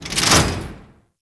Traps Turret Door Open; Ambience; Warframe; Grineer Fortress

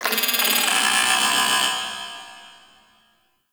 Robotic Chatter; Warframe; Guild

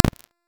Fist Claw Spike Despawn; Warframe; Tenno; Incarnon

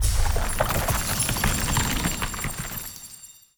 Shrine Portal Warp Action Hold Start; Objects

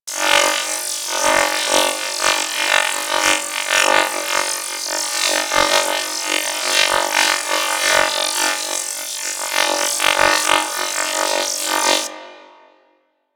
Laser Loop; Warframe; Ambience; Corpus

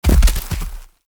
Rock Trap Rock Fall; Objects; Ode Lantern Crate

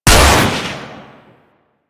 Magnum Shot 2D, Weapons; Warframe; Tenno

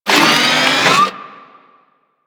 Research Facil Repair Robot Power On; Warframe; Ambience; Venus Open World; Objective Rooms; Props One Offs

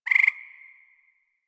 Forest Frog Close; Warframe; Grineer Forest

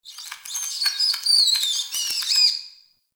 Town Street Critter, Ambience; Warframe; Duviri

